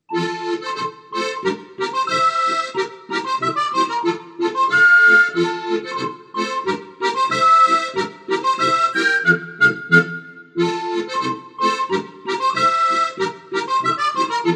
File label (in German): Talblick-Polka